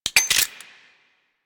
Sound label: Gunblade Mech